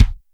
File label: kick, Roland R-70, BD